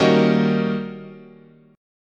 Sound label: Eb 7th Sharp 9th